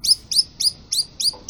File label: bark